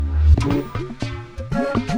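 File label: Music Dpad Base 120; From Dust; PS3; Xbox 360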